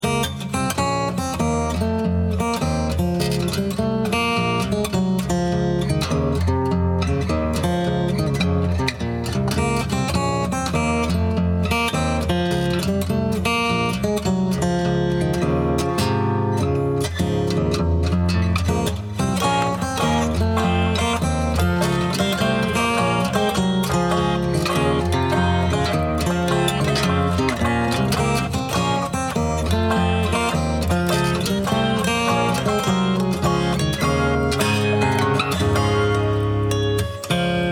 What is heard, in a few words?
Swingin Baritone Bouree In E Minor